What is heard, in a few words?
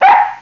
bark; trilogy